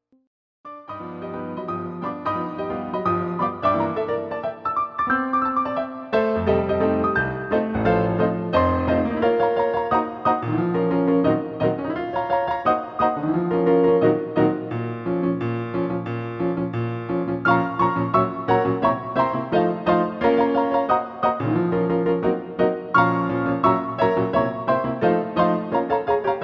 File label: piano Roll